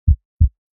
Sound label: heartbeat sound